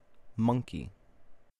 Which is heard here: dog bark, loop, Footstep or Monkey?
Monkey